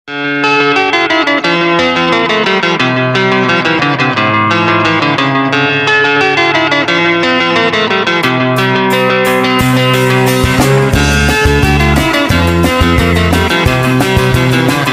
speed up nightcore

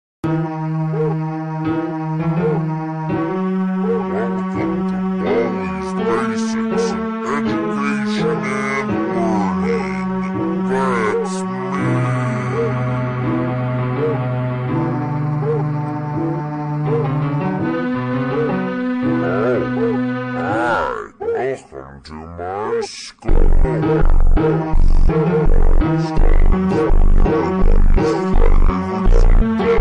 funk ultra slowed

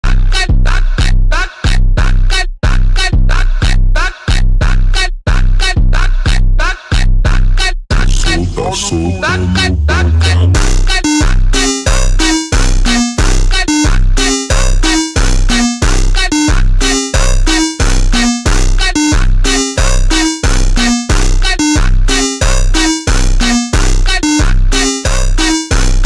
automotivo slowed; Tetris

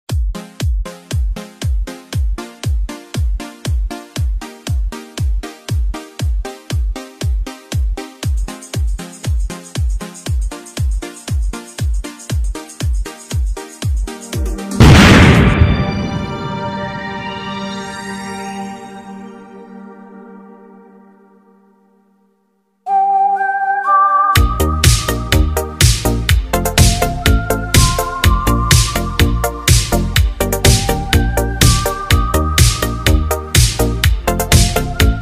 italo disco cover

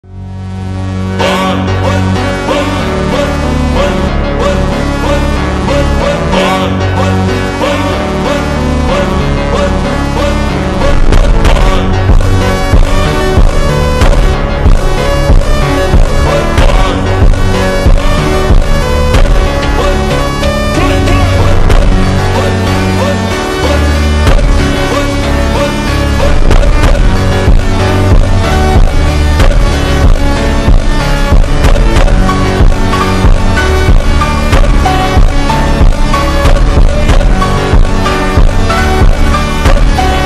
slowed reverb

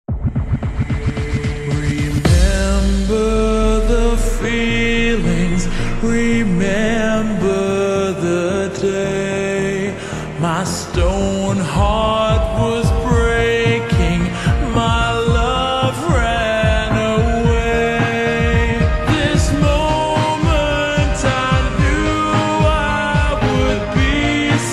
slowed reverb

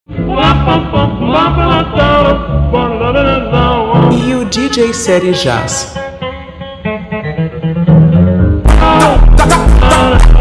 funk slowed reverb

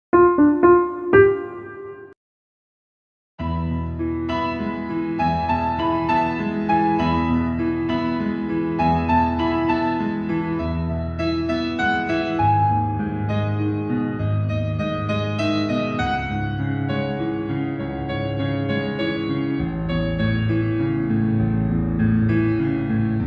piano karaoke midi; караоке

